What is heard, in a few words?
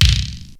PERC EFF; Crypton - DrumBase5000